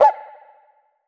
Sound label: Cuica High, One Shots, Drums, Misc Percussion